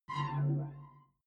sci-fi visor clean; krieg-waffen; soundpool

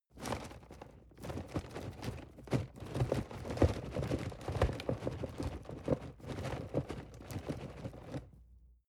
cardboard box medium shake soft long; objekte

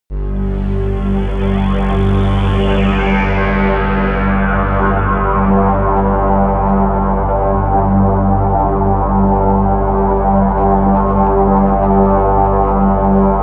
bass pad; flaechen

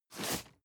cardboard box medium slide short hard; objekte

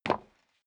step sneaker wood creak single stair down; schritte

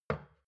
shot glass place table soft; soundpool

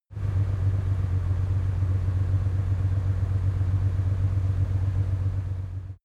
car lamborghini countach idle

